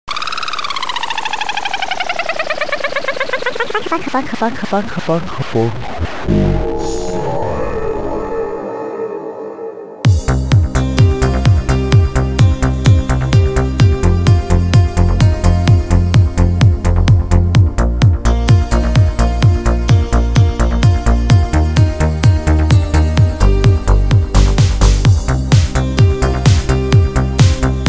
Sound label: snow falls korg pa 700 dance bass; korgstyle